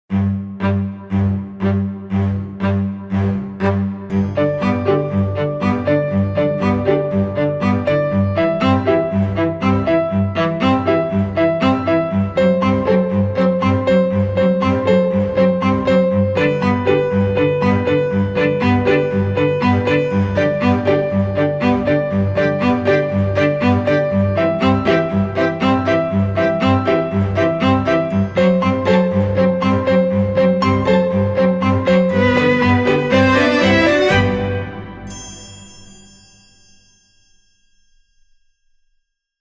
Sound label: no violin melody